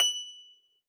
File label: F, Harpsichord; Total Piano